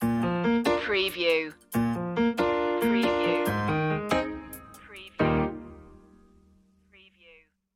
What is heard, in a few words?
happy and stylish stinger